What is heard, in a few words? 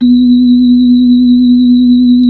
B-3, Hollow; Keyboards of The 60's and 70's